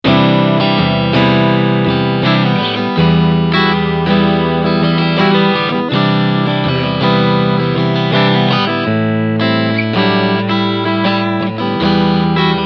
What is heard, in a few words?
Clean; ICON I